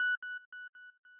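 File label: square lead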